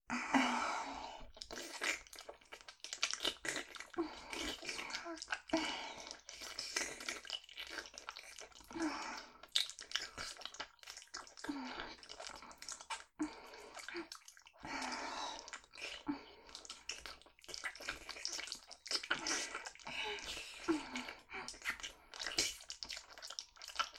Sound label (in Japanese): フェラチオ, プレイ音のみ